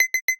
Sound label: NFC Failure; Nothing Phone (2)